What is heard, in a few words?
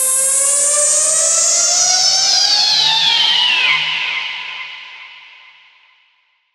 club risers